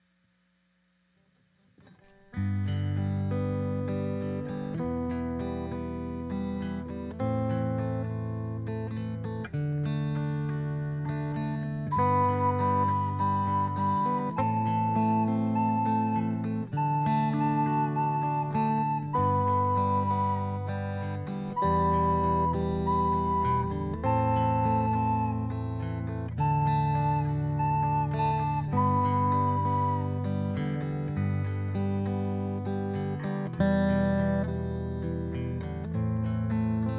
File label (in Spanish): flauta